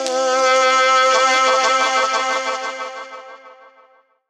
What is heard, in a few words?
BLO SAX MU FX; Rawcutz; Nu Forms